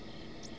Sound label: cawa